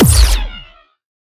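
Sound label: Sci weapon Shot Medium Blaster Punisher; Robobeat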